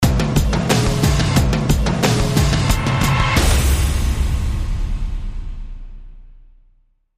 Rock Boom Sting

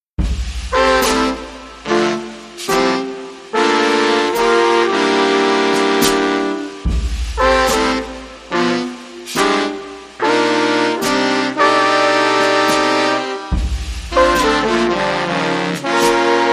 Horns & Drums

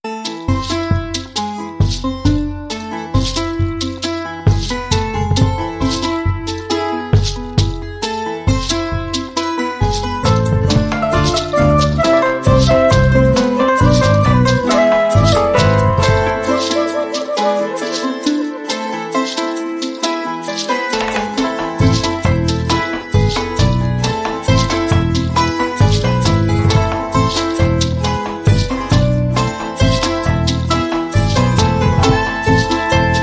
Columbian Waltz

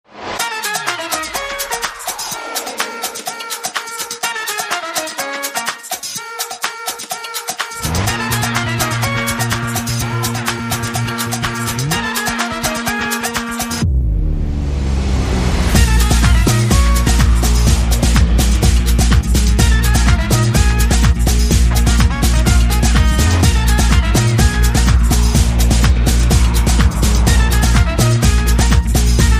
Light Percussion

